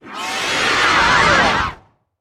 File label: Space Demon Horse